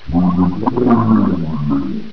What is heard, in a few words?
drown; quake2; ranger